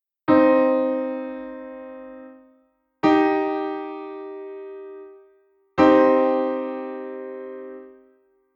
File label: do mi flat, mi flat sol triad